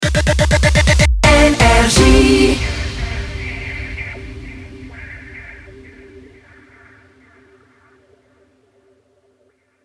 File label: Jingle Rapid Fire; NRJ